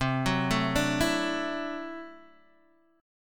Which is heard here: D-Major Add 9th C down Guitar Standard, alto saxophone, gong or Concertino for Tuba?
D-Major Add 9th C down Guitar Standard